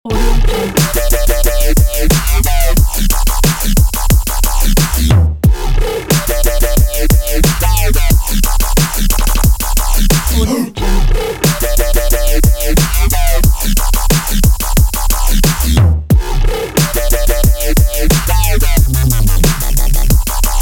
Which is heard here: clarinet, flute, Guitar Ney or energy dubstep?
energy dubstep